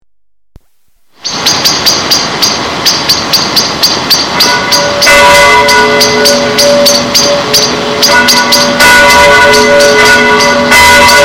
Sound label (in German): Glocke